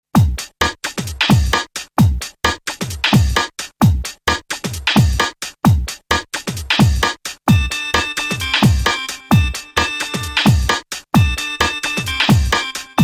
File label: pachinko 2step